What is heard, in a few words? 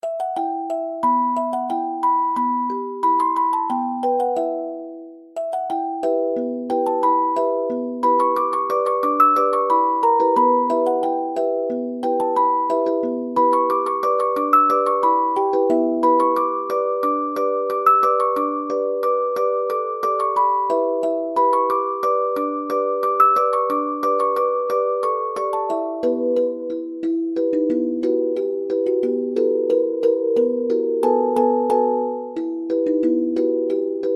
music box